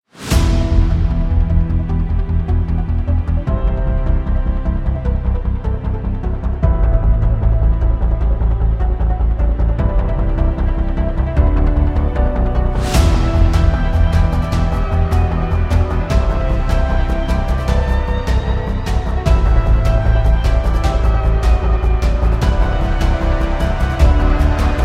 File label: Sci-Fi Powerful Cyber Cinematic